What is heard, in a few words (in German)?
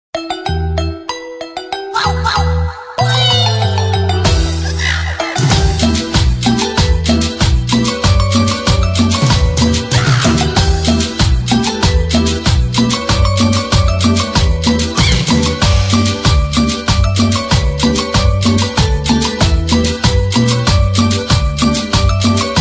Orientalische Musik